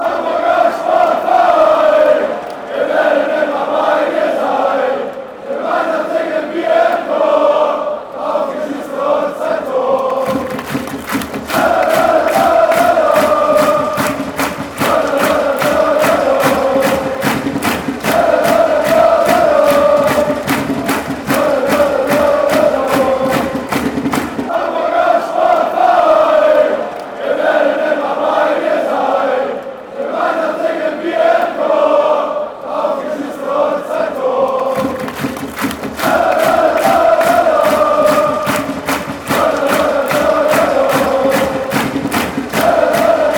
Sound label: hamburg sport club fanchants